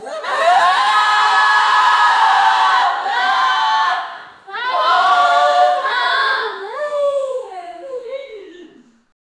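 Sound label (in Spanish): un grupo de mujeres españ, cheering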